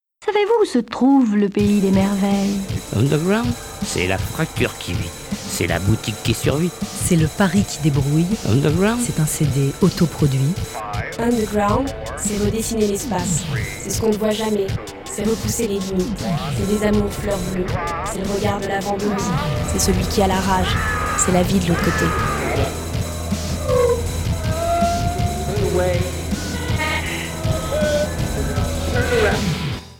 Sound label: Radio Nova Jingle; Nova 25 ans - 2002